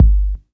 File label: Bassdrum Clean; Best Service; House Definition VOL-1